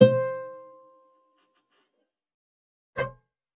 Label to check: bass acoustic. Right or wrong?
Right